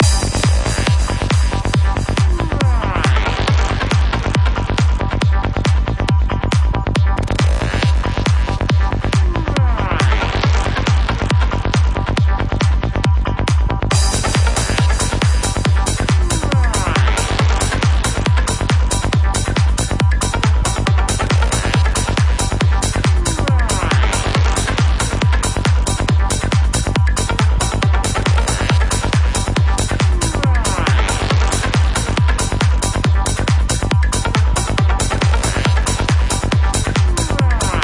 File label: PSY KIT; DMS